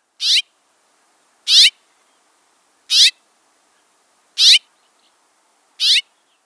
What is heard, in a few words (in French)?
cri